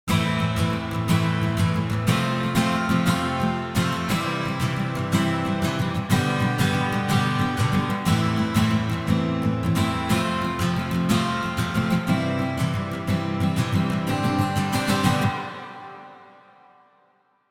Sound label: Reverb - Guitar Strumming - Midrange Hall; CRTIV Reverb